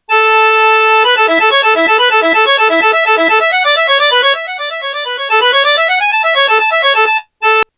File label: akord